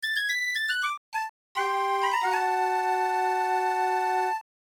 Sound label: Game Over